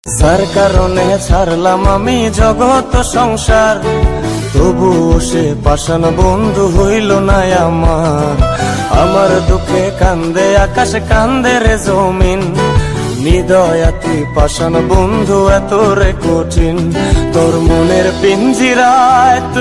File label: Bengali Ringtone